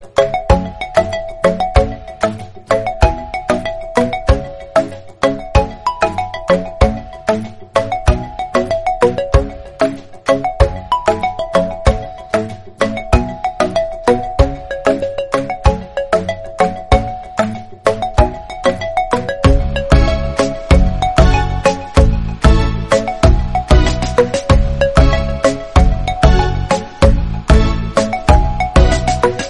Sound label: Piano Version Ringtone